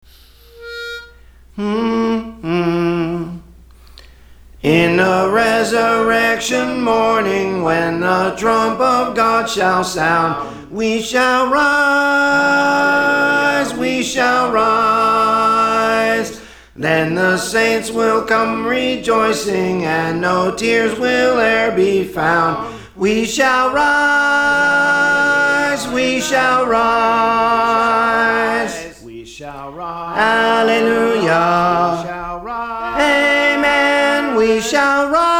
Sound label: Soprano; Praise For The Lord Training